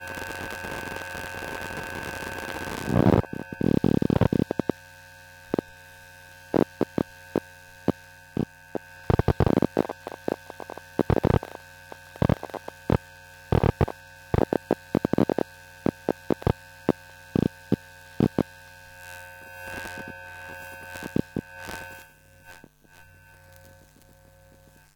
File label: Electromagnetic; InMotionAudio; Electromagnesis Samples Volume 1